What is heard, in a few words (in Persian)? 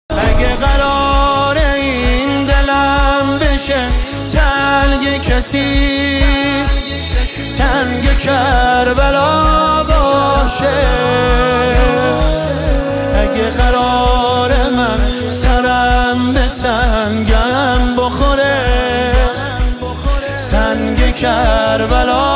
مداحی استودیویی احساسی نماهنگ